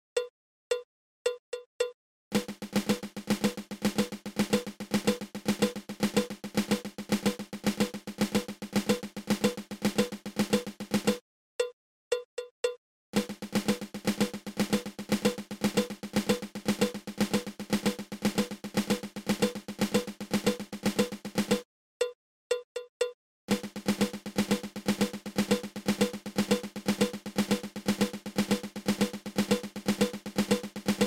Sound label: Patafla fla